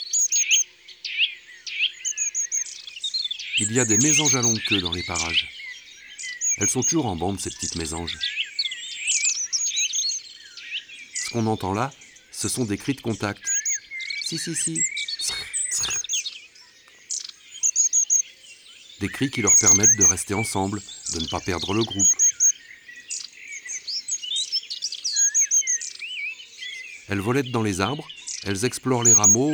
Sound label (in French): Mesange a longue queue, ABC des chants d'oiseaux